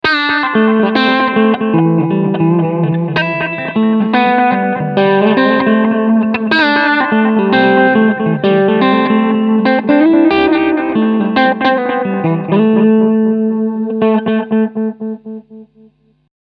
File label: Medium Delay